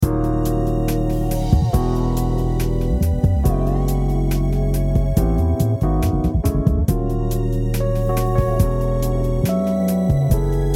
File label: Instrumental kit drum